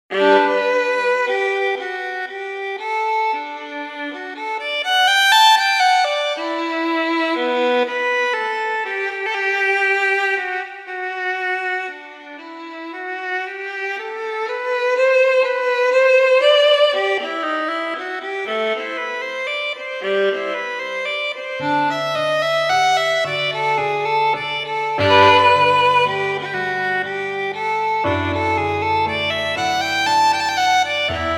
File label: Menuet